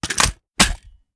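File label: reload empty drum drum in, shotgun; gmod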